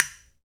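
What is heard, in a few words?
PRC CASTAN castanets, Perc; Northstar - Drumscapes Roland; Bonus Perc; Block&Clave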